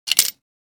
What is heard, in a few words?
Casino game slot machine insert coin coins